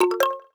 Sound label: speed walk on